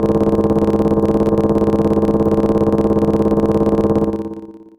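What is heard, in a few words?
Alarm Call DX100 F#3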